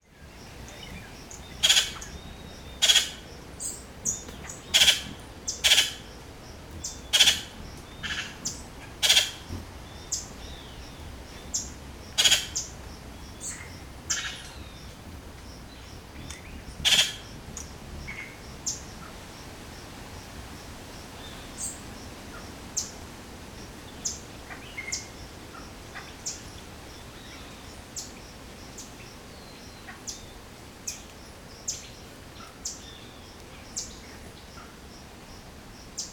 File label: nature ambience forest dawn light wind in trees birds grey hawk jay colibri; felix blume